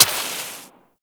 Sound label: Water Sprayer Mech; Warframe; Weapons